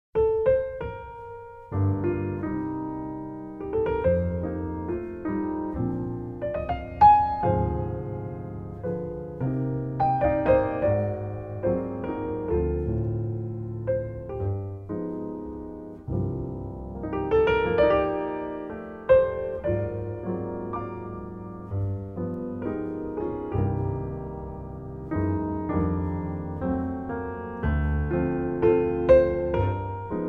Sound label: solo piano